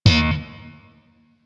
Shawzin Slap Oct Two D Sharp, Chords; Music; Warframe; Sentient Shawzin

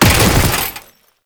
Body Fall Default; Enemies; Warframe; Tau Dax

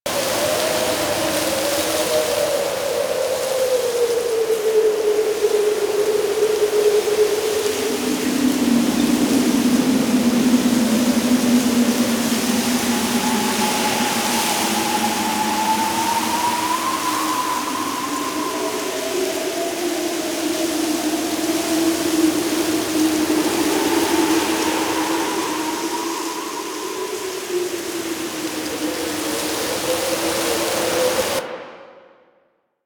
Exterior Foreground Field Angry Jealous Loop; Ambience; Duviri; Warframe

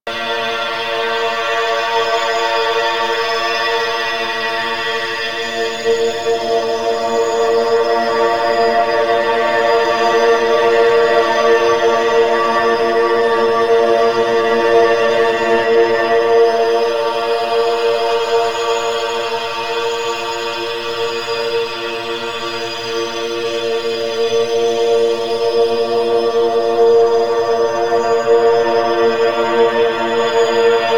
Shrine Bed; Warframe; Ambience; Grineer Forest; Forest Titania Shrine

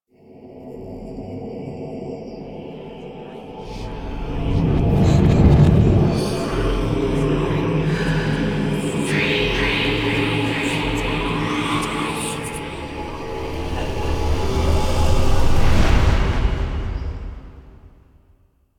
Dream Stinger; Duviri; Dormizone

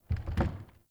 Wood Stool Impact Light

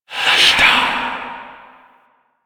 Fire Whispers; Warframe; Tenno; Grimoire

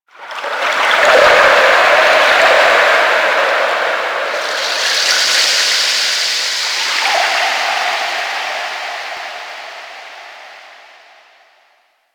Ocean Underwater Texture Small; Ambience; Warframe; Grineer Ocean

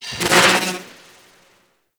Microwave Pistol Tail, Weapons; Grineer

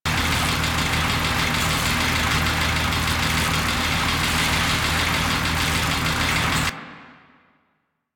Gas Conveyor Loop; Ambience; Warframe; Corpus Gas City

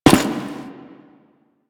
Step Default; Warframe; The New War; Veso

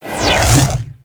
Glaive Charge; Enemies; Weapons; Warframe; Teshin; DE

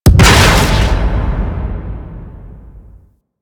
Scaffold Explosion; Warframe; Railjack

